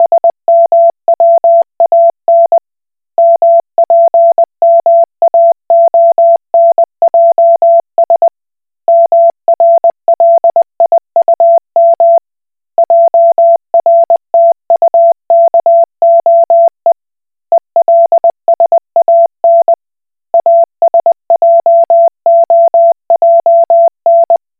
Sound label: Koch Morse